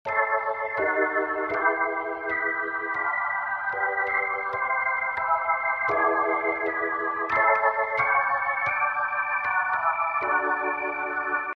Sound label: swirling high drawbars